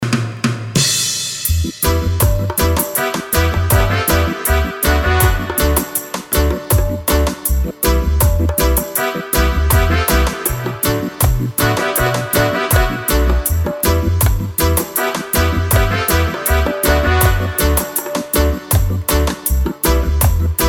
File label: Water Instrumental